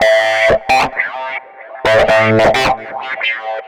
Guitar Shop, Underground UK House & Electro, Synths; Sonic Foundry (Sony Creative Software)